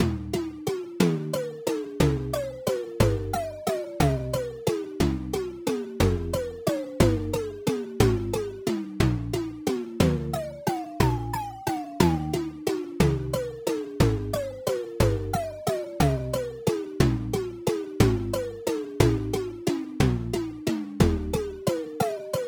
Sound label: melodic tom